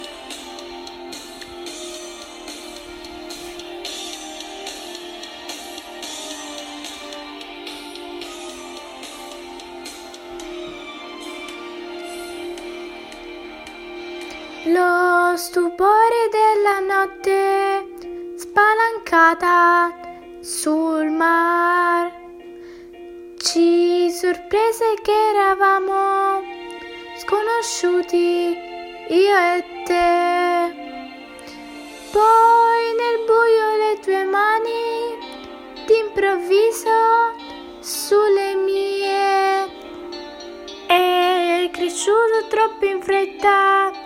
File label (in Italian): canto; musica attivita svolta durante la didattica a distanza